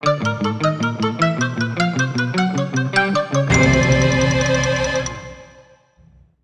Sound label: Bari Sax